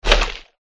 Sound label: dragon boss attack contact middle